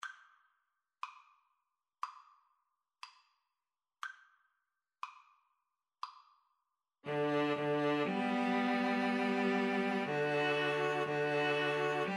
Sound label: zimbabwe national, String trio